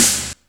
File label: MIX SD; DRUM RYTHM; AKAI S6000 CD-ROM - Volume 1